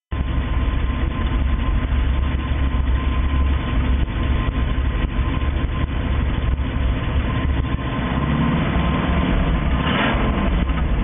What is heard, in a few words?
68 firebird, exhaust; 68firebirdsnd